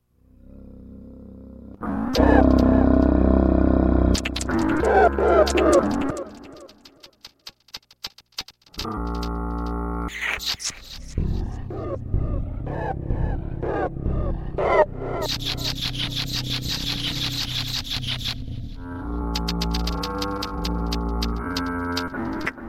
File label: concrete; spellman studio archives fall 1997